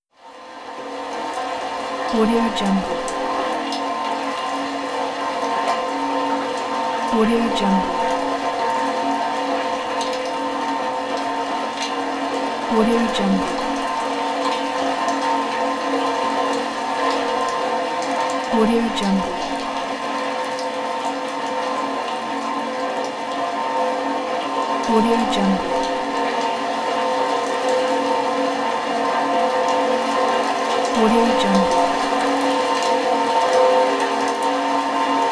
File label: rain on metal